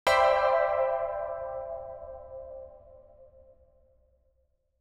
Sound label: ambient